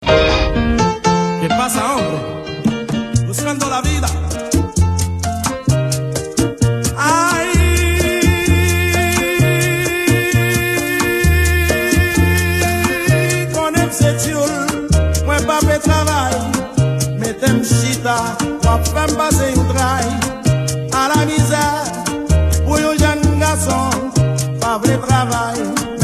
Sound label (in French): Musique Salsa